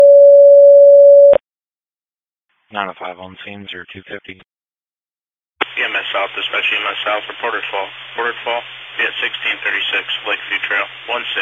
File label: Rescue, Tone Alerts; Darke Greenville; Saint Marys